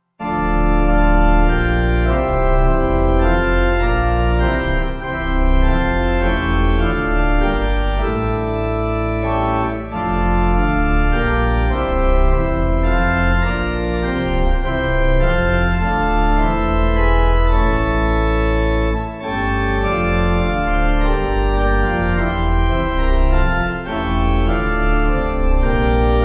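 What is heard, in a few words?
Pipe, hymn backing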